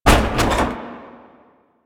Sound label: Metal Ladder Hit; Warframe; Ambience; Nineteen Ninety Nine